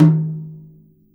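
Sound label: Jazz kit tom, Drums Acoustic; USB Soundscan vol.10; AKAI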